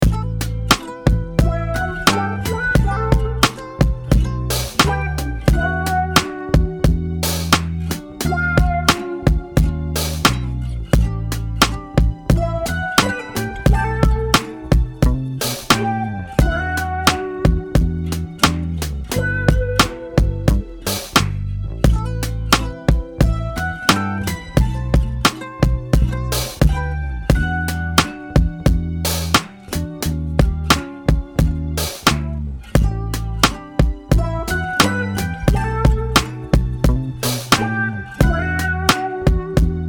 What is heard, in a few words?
Cosmic Vintage Drums; Open Soul Audio